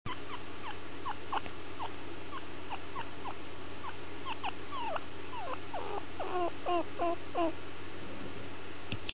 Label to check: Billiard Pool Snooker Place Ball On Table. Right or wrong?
Wrong